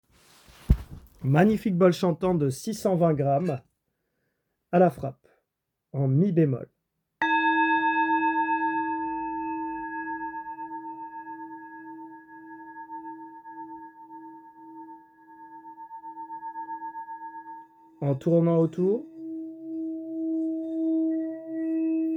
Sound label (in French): Bol Tibetain Grave Martele 7 Metaux Note Mi bemol